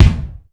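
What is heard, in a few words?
GOGO kick, GO GO DRUMS; Crypton - DrumBase5000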